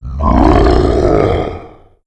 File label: rise